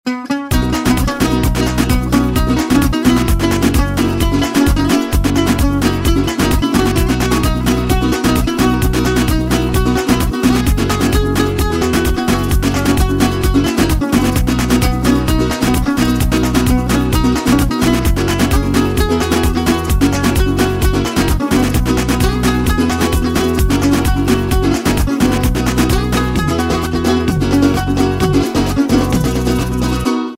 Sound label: carabobo jingle pista